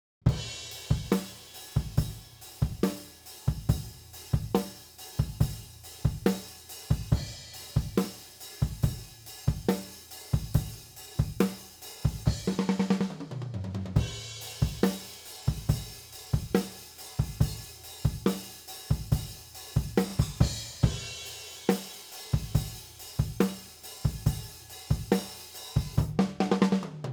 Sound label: Drum Bus Processing OFF; Sonimus